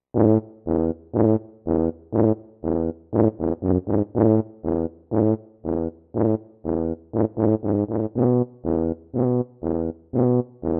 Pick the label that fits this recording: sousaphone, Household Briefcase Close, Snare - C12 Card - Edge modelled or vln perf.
sousaphone